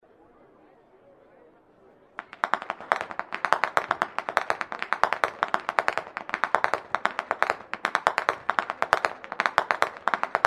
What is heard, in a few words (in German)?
schnalzen, jugend